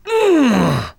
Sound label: Emote Effort; Light Street